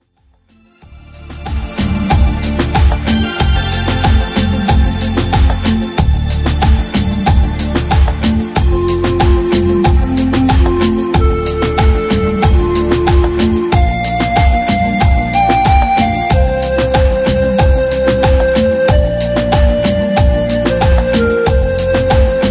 Cumbia

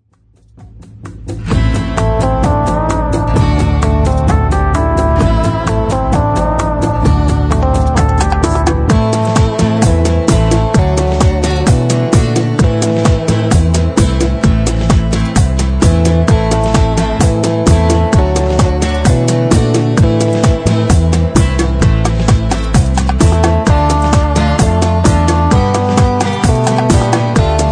Bachata

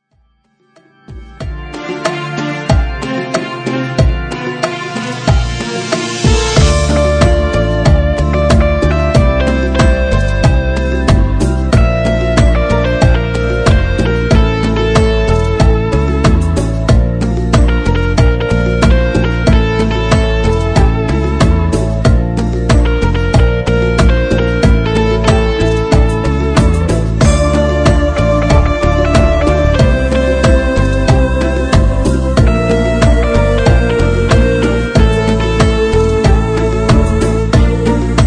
Cumbia

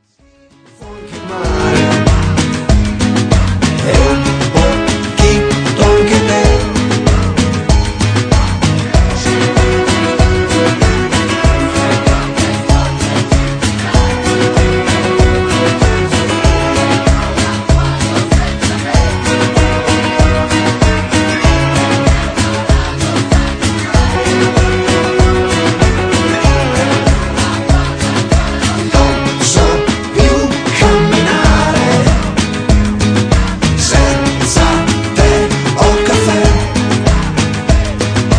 Cumbia

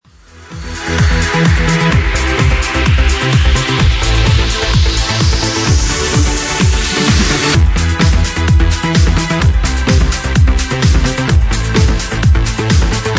Remix Club Mix

